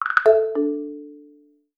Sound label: failed; quiz paris